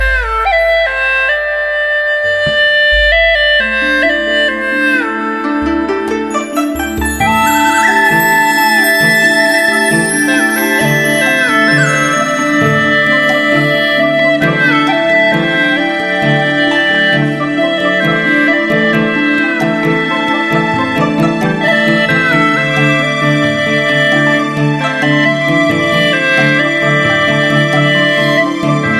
Heart Touching Tone

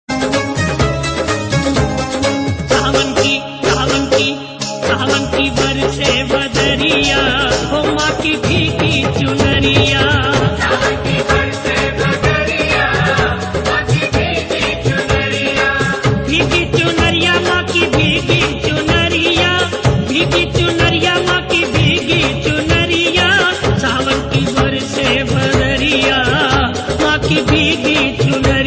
Durga Dj Mix Aarti

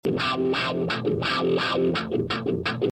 Wah Wah Wah Wah

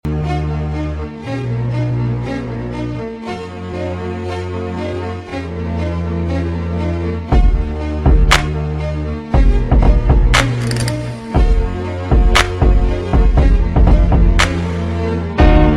Beat Mix

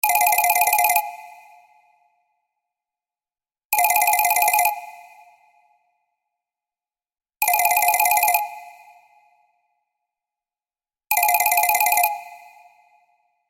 Call Ethnic

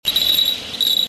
Bell; Akatsuki

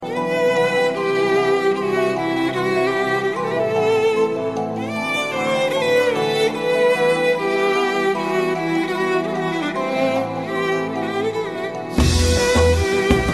Rinnng Tone

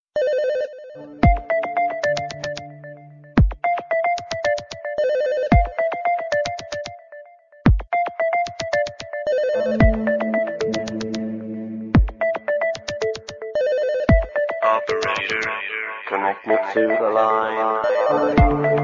New Cool Ringtone

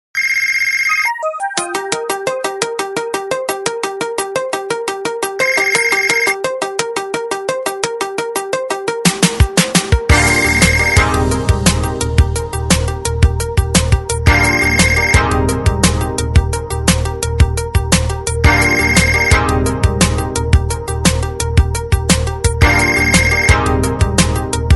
World Phone

